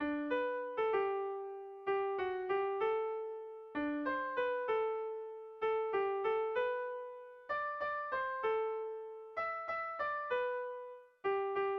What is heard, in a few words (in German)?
trommel ruft die